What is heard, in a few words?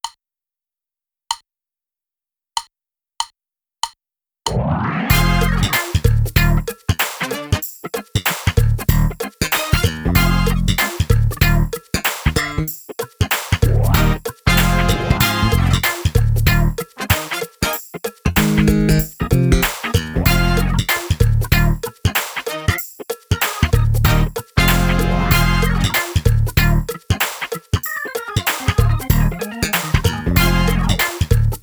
Funk drumless